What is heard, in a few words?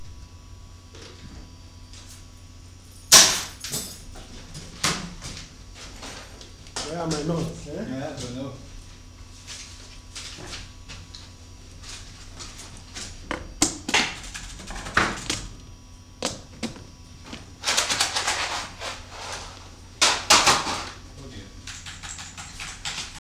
odd noises, binaural